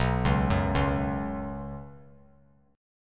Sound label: B Suspended 2nd, chord; arpeggiated; JGuitar